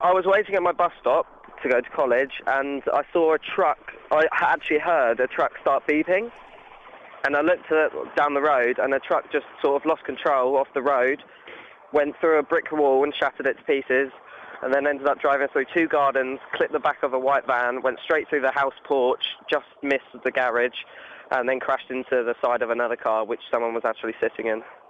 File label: gritter crash